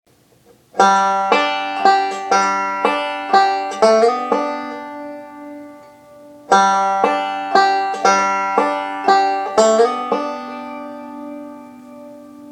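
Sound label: lick, banjo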